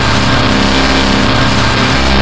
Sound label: laser loop